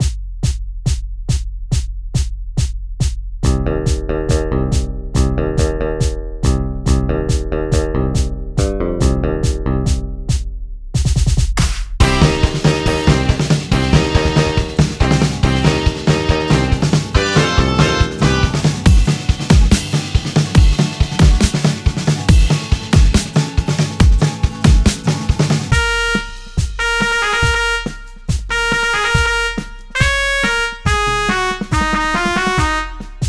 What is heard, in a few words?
electronic dance